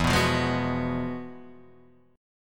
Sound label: F Diminished 9th E; Fdim9/E Chord {0 2 3 1 0 2} strummed; dim9:1,b3,b5,7,b9